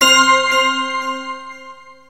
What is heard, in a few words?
Correct Answer Minor; timez attack